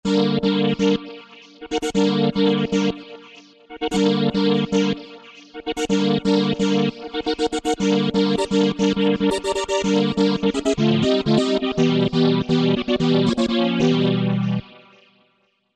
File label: Minor Cuts, Roland V-Synth; Factory Patches